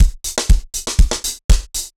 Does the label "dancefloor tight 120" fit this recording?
Yes